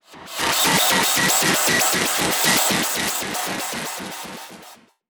Production Element Title Transition Data Transmission Communication